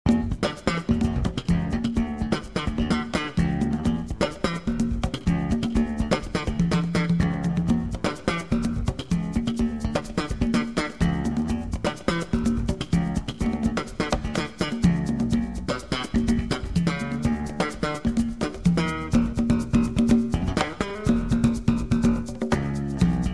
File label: conga bass, Playbacks